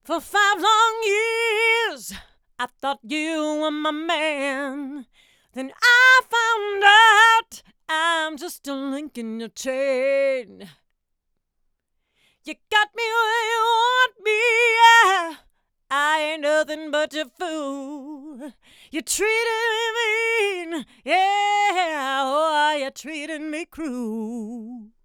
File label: Voc Brute Comp Loud